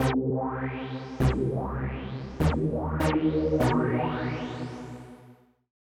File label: intro synth; 'Go Fuck Yourself' samples; lolicore